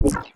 Sci-fi Pulse Gun Blast

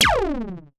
laser beam phew

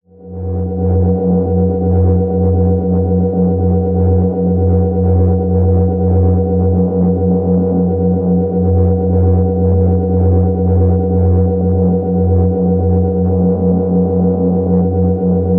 Sci-fi Rumbling Ambience

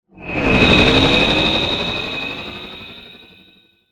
Oscillating Sci-fi Transition

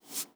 Soft Slide Short

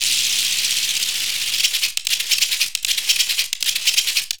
Miscellaneous Glitch

